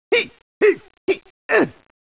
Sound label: hit jump; rattrap